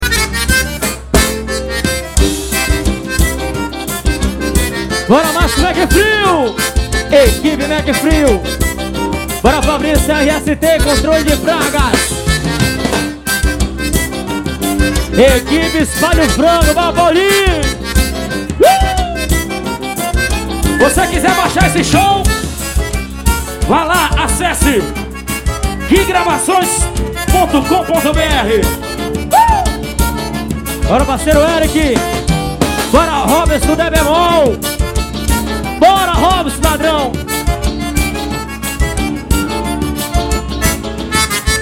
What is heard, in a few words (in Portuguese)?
forró; Manaus AM